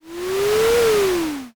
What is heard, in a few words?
ship pass